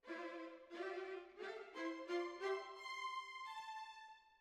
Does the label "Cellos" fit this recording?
Yes